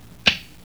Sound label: CLICK OFF